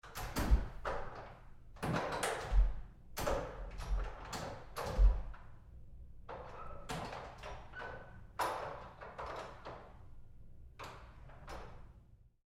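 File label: Door Bathroom Stall Handling Roomy Light Long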